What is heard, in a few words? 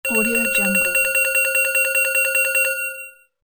ringing call